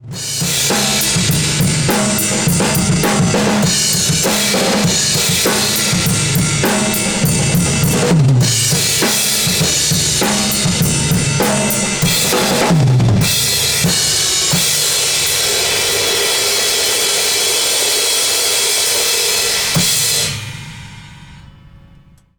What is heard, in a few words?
Drum Overhead